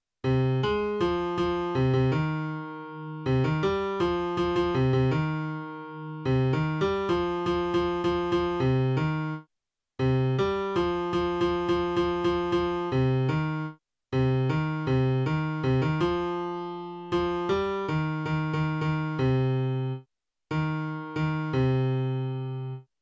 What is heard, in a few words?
piano